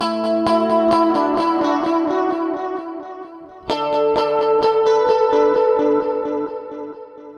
130 Dmaj Gtr Shimmers; Guitar; Zero-G; Future Wave

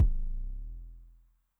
BD MOD Pitch LOW RISE, bass drum, 909; classic machines; 909mod

